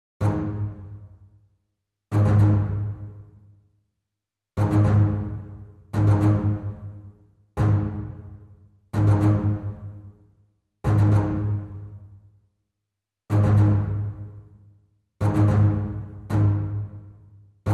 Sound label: Strings Only